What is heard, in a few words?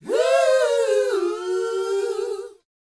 DANCE 120, Vocal